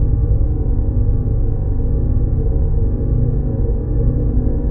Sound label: Reactor Room; Duke Nukem Forever